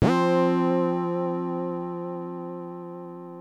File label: SYNTH Cosmo Harp